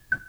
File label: drop